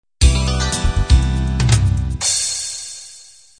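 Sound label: standard 16 beat intro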